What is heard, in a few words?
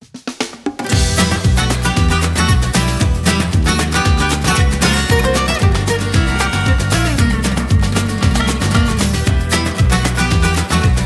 Music